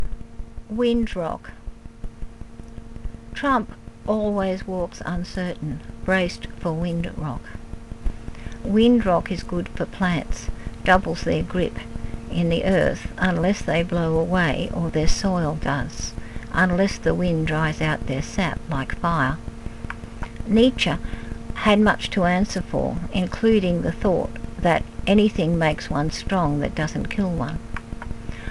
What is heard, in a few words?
wind rock